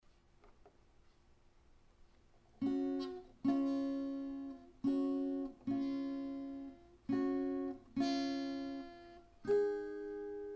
Vocal Solo with Guitar; classical flute

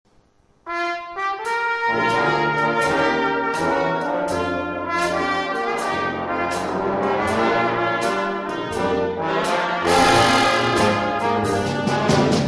Blues, jazz band

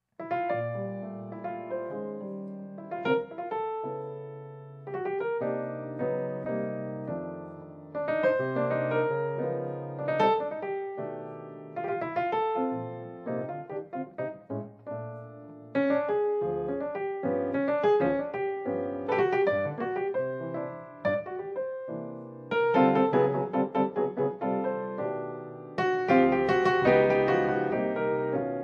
piano

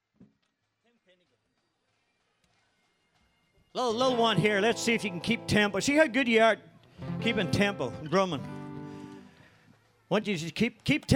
irish band; finnegan

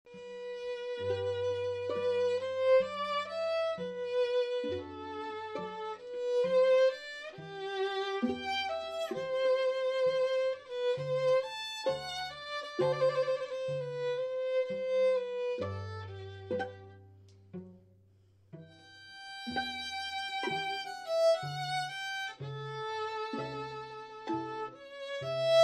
String Quartet; Kingswood String Quartet

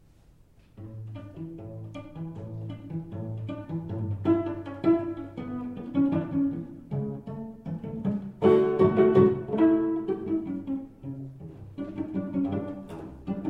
Tsintsadze Chonguri, cello

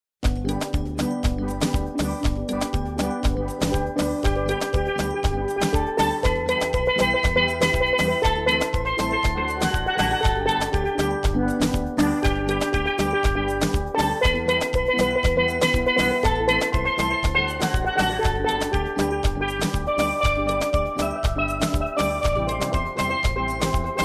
Steel Drum

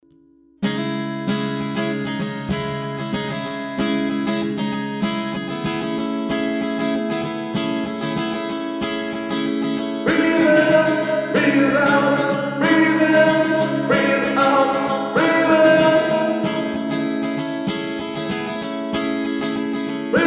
acoustic guitar

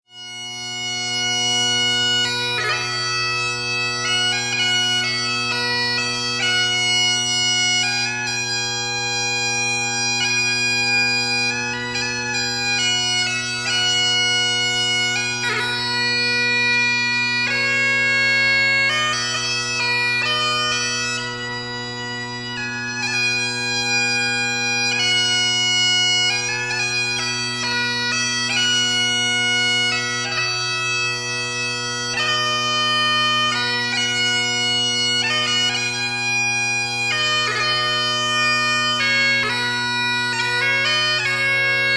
Bagpipes

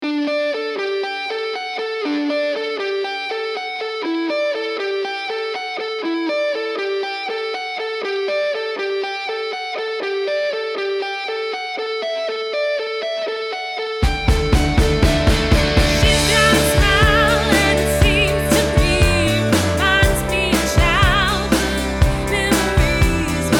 Dance Band

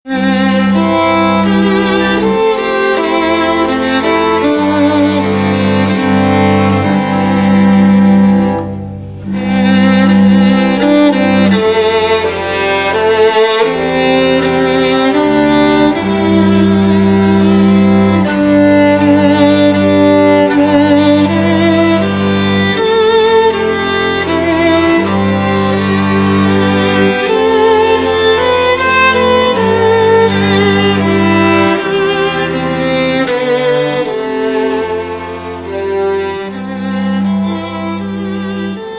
Celtic Traditional, String Quartet; Be Thou My Vision

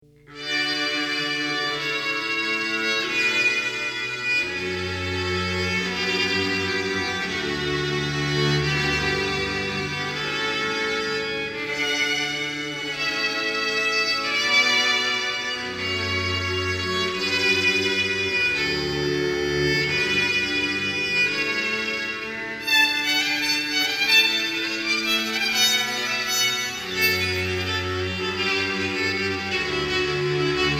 Canon in D String Trio; violin

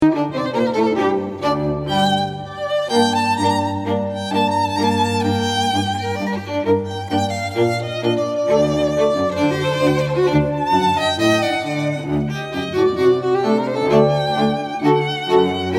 La Cumparsita trio, String Quartet; Eloquence String Quartet

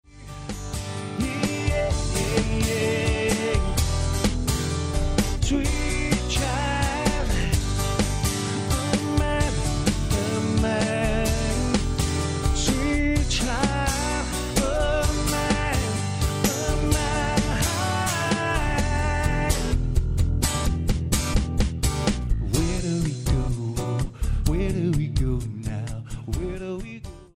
LIVE, Acoustic Guitar

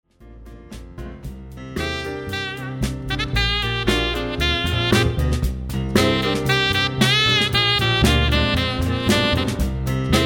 jazz band

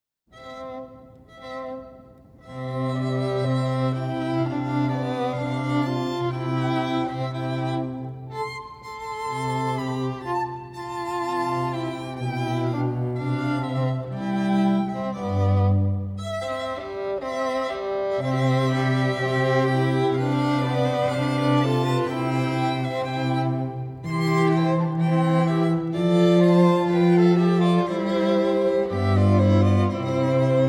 string quartet; Classical Quartet; Mozart Romanze from Eine Kleine Nachtmusik

